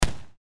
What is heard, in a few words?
boxing single punch